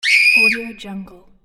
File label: girl scream